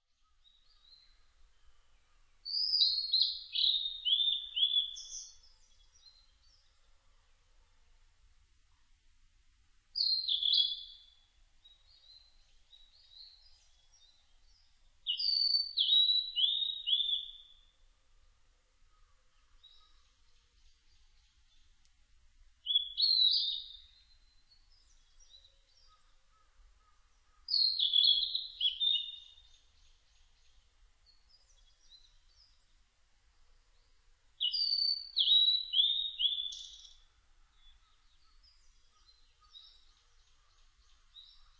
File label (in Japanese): ooruri